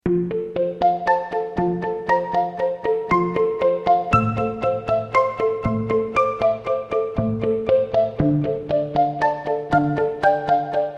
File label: supersweet delay